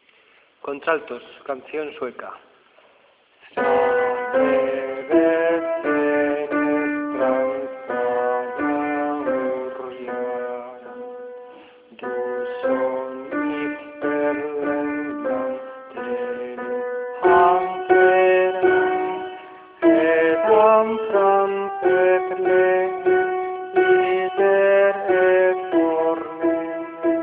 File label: alto